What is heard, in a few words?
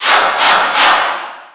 Cheer NTSC; Alph; SSB4